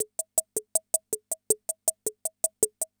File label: CR78; Best Service; XX Large Most Wanted